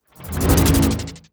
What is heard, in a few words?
Jolt; Mono